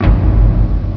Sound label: thump; Quake; PainKeep